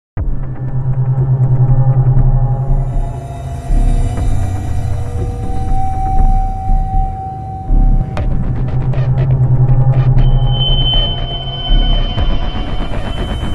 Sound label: Crime Sting